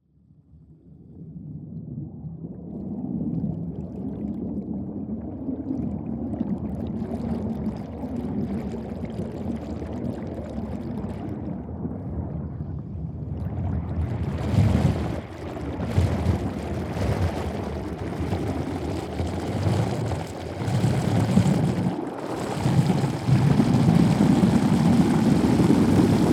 nature water flowing water recorded underwater swimming pool; felix blume